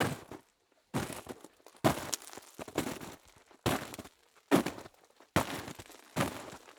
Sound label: Footsteps Icy Snow; Soundopolis